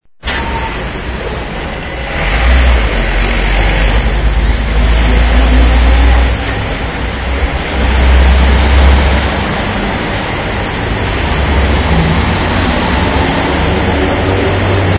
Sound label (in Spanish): sonido trafico